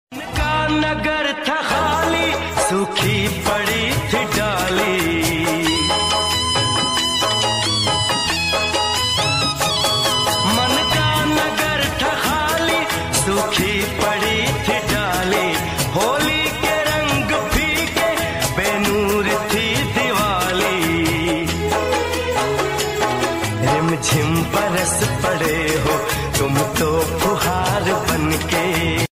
Old Hindi Song Ringtone

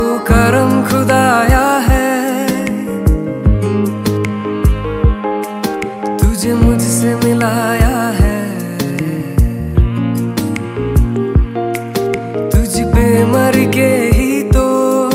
Hindi Song Ringtone

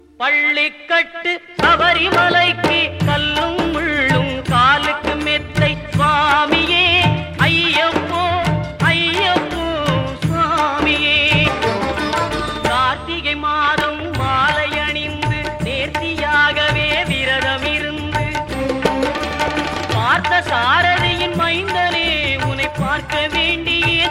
Ayyappan Tamil Song

